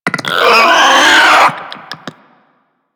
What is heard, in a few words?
Infested Ancient Charge; Enemies; Warframe; Puss Ancient